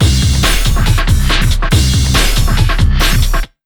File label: Big Beat Drumloops, 140